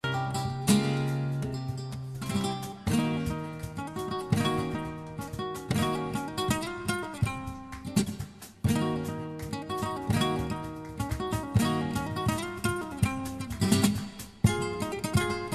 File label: bulli, flamenco